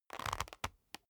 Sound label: leather creak short soft